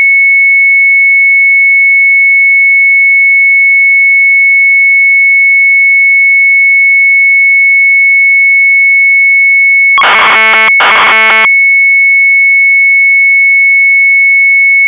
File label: MZ800 Tape, sharp; SIMULATIE init; retromelsarchive